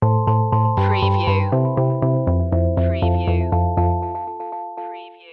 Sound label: starry lights stinger